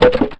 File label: megalodon die